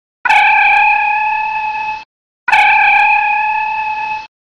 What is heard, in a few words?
sonar ping, ringtones